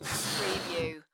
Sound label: Balloon Inflating